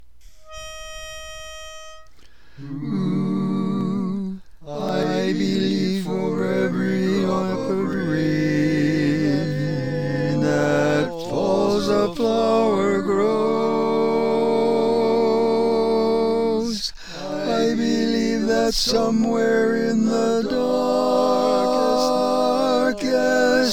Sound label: Baritone, barbershop